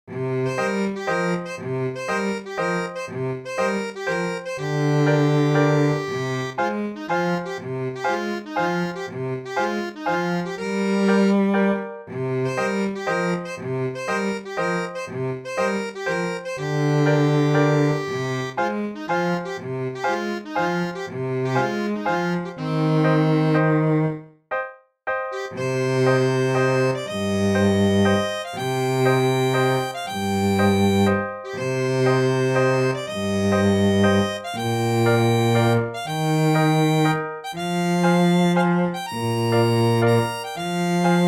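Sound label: accordion; tout est si simple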